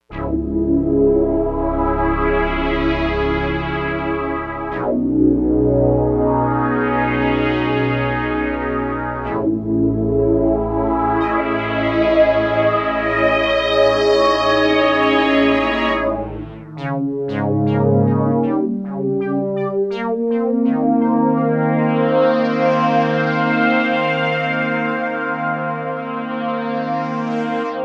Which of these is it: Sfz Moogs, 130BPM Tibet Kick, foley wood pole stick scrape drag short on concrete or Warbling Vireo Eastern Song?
Sfz Moogs